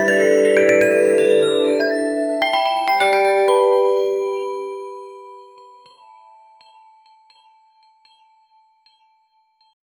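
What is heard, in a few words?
retro crystal item pick up; video game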